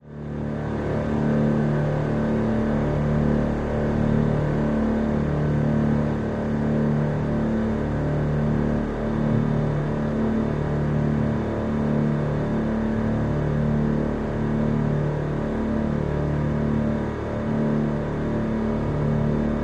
Military Pbr: Onboard Medium-fast Speed Cruise And Decelerate To Idle And Off. Dual